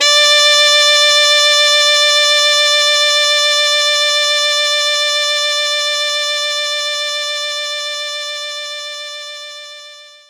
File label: Baritone Sax F3